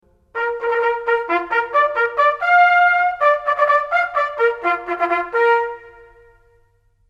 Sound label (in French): Rassemblement, sonneries; Sonneries reglementaires; BIGORS MARSOUINS